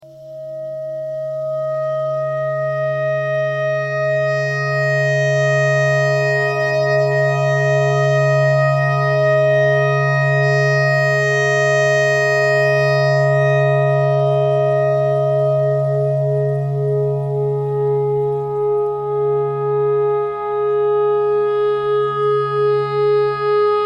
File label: clarinet notes e clarinet thread simple